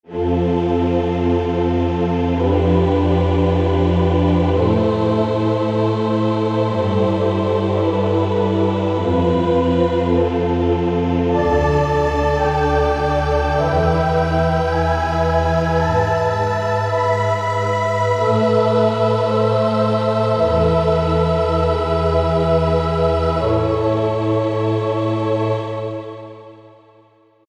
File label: Haunting Pad; Sonic Reality Modern Songwriter